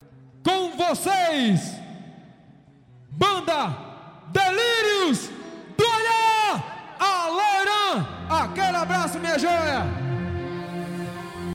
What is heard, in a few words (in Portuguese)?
abertura ao vivo, arrocha; os melhores do arrocha na bahia